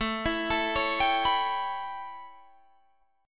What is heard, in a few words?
A 9th A, Guitar Open D